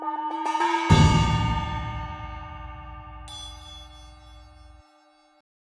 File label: big taiko